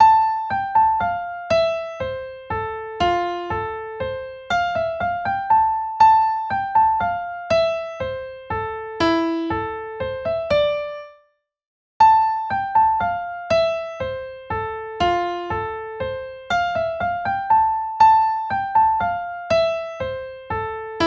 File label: Waltz original tempo